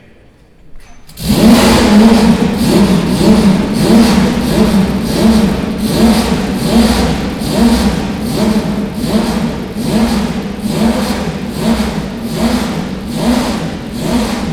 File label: Porsche 917 KH; Porsche Soundnacht 2018